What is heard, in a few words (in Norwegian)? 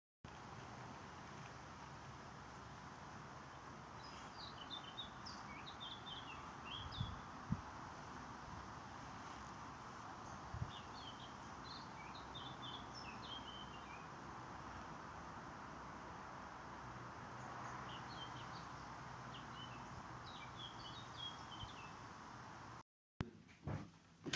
fuglesang